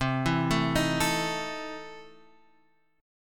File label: E Major 7th Suspended 4th Sharp 5th, C